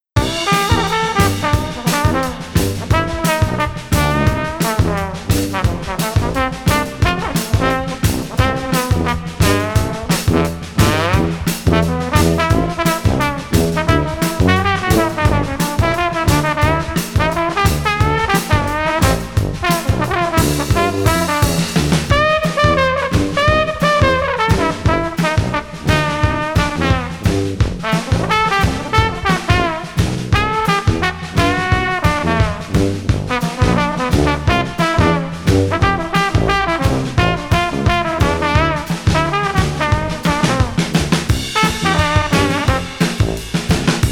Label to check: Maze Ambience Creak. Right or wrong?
Wrong